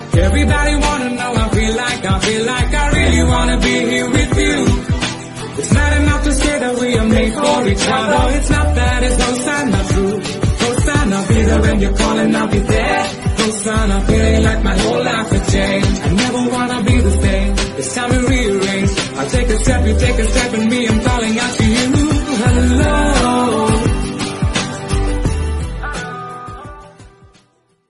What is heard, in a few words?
english